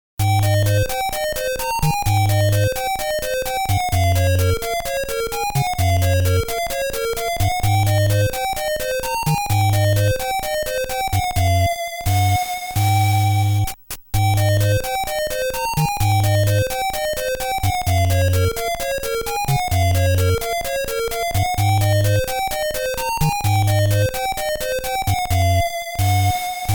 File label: Koto; Nekketsu Kouha Kunio-kun Ongakushuu